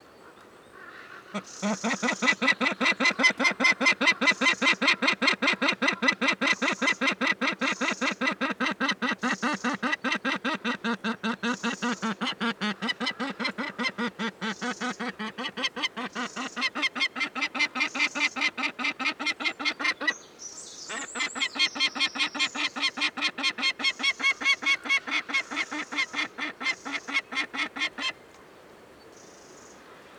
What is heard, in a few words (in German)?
Wanderfalke Falco peregrinus